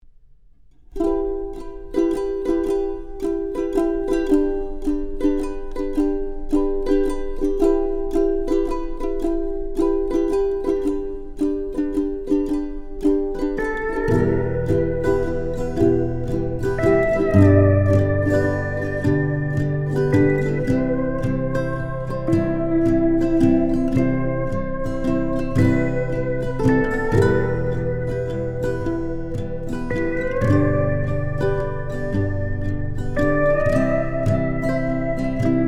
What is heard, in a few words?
Emotional Hawaii Minimal instrumental